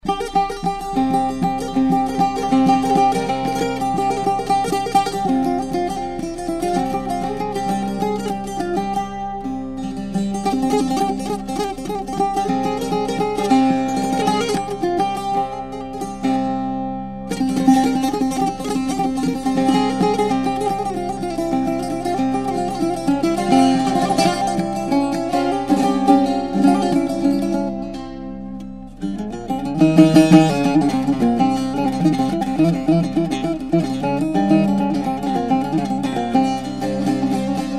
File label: music in tent